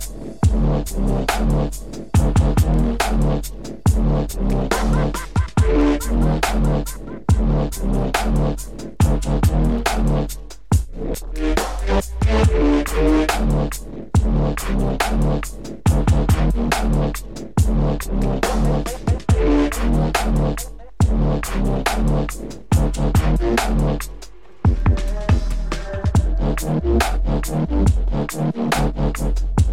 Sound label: taiko